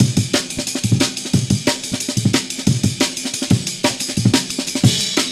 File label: amen